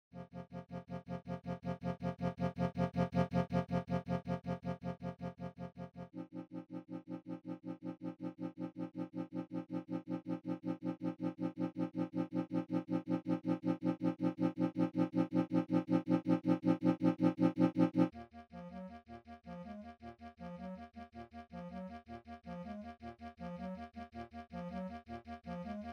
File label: Dance Rain